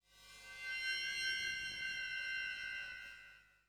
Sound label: ethno 125